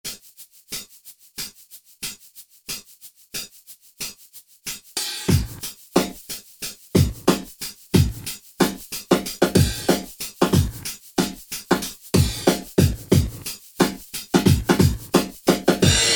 Sloppy Drms; Alesis Rap Techno Dance

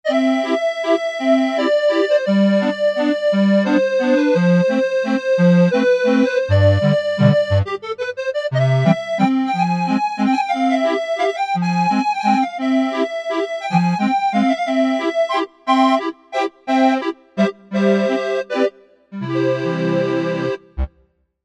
Accordion

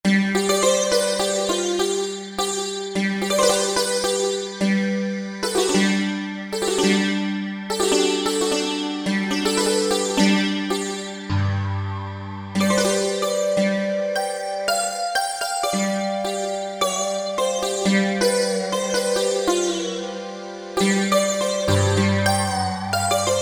Sitar, Roland SR-JV80-01 Pop

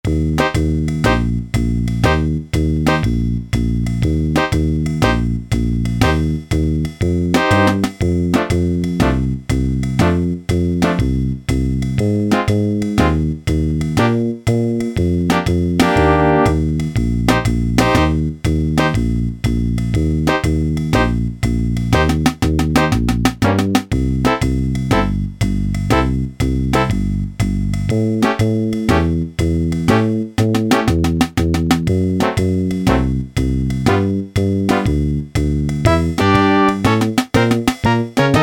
BIG BAND, Yamaha SHS-10 Factory style demos

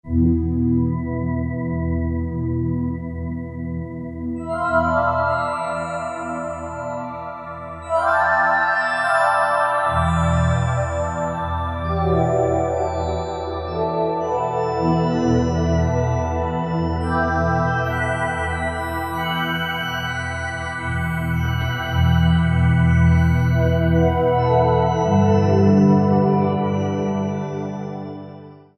Kurzweil K2000 Timershift; Kurzweil K2000 V3; Factory Programs

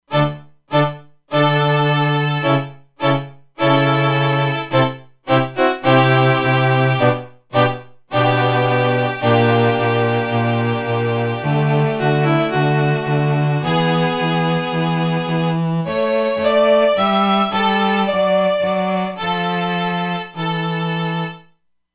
Quartet; Factory Presets; E-mu Proteus-2

